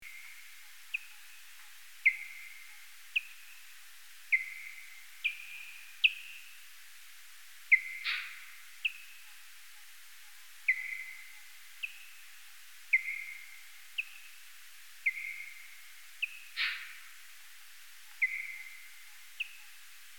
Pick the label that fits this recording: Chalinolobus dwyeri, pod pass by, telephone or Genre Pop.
Chalinolobus dwyeri